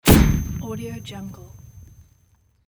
explosion flash; fishermann

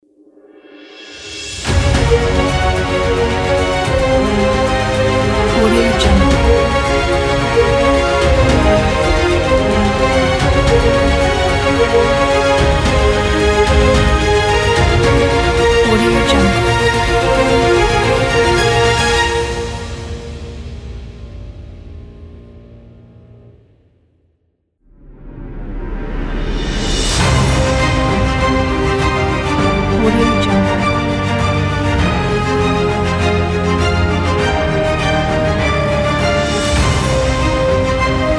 epic news logo pack

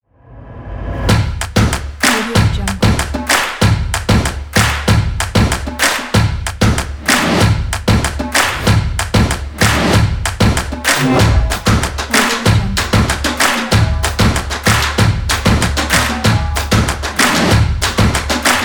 energetic percussion drums

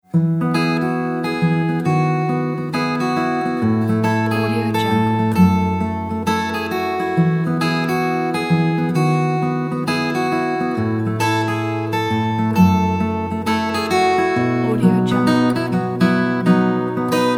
acoustic guitar solo

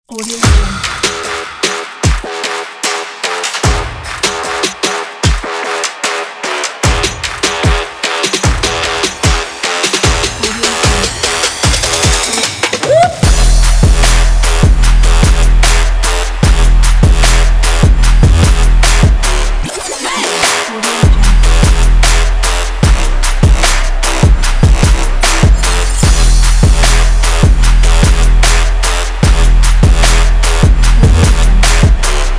extreme sport

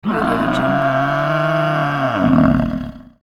buffalo single growl; sound ideas

